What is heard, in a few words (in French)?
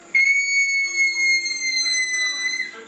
cri femelle paresseux